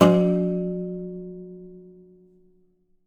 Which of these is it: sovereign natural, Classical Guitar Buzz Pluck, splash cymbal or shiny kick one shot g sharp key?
Classical Guitar Buzz Pluck